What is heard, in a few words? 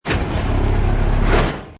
Hi Tech Panel Servo Button